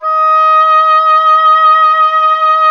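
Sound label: WIND OBOE; Miroslav Vitous; Solo Instruments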